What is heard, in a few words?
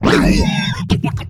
alianhit; enemy; general combat